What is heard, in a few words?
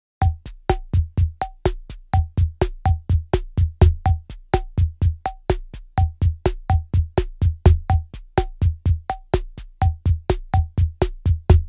RHYTHM LATIN